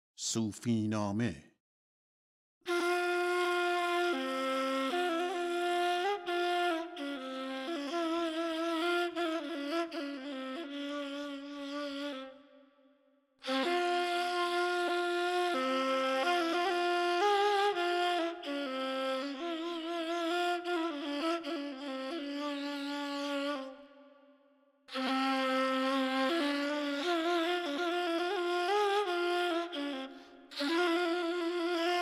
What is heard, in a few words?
Sufinameh Isfahan Radif-e Ney; Avaz-e Isfahan